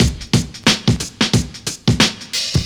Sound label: Drum loops, Boombap